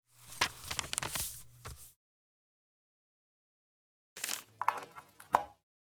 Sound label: Tube Note